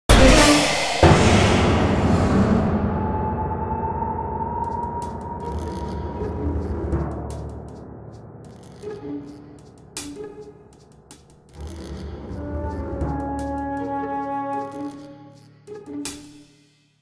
Fight Win; music; Silas; The Da Vinci Code; XBOX

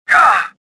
pain; Star Wars Jedi Knight II Jedi Outcast; XBOX

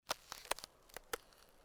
ice; Soldier Of Fortune II Double Helix; XBOX; kamchatka

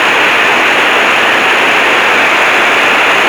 whoosh; Decors

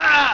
bail vox; skater; Tony Hawk's Project 8; XBOX

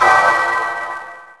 ring of fire; Finding Nemo; XBOX

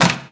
Wood plank freeze; debris; Max Payne

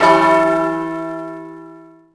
low bell; Tony Hawk's Project 8; XBOX

